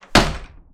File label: Kicking A Door